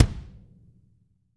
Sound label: BD, DR BD; Best Service Gigapack 2